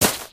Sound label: gravel, human step